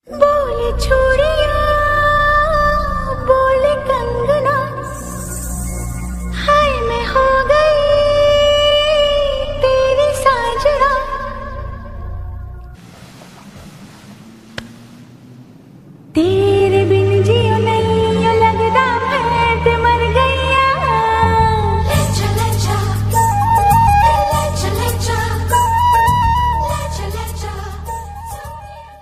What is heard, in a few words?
slowed hindi ringtone